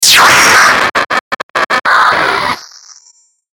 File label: Glitch Harsh Static Bright Stutter, Glitch Digital Distortion Feedback Squeals; glitches; Blastwave